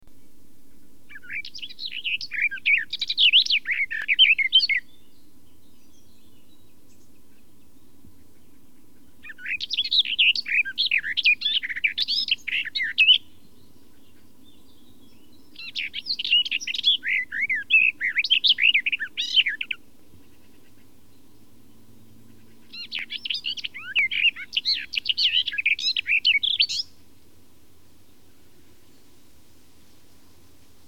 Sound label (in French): fauvette des jardins